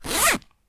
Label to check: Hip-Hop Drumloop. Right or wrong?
Wrong